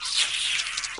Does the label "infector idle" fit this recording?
Yes